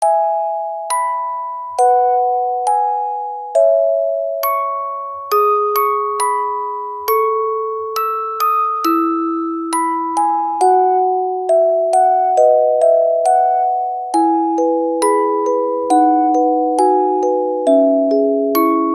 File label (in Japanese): orgel